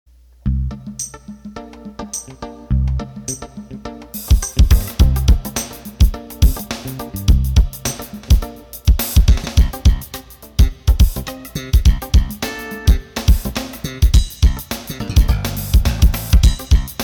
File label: Funk, accordion